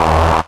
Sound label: Synths E; vengeance essential club sounds-3